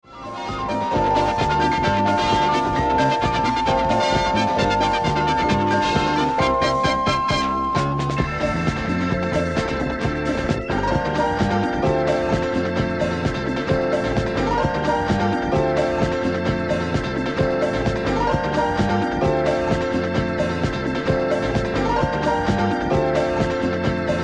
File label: Steel City Disco